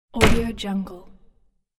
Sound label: metallic door shut; domestic sounds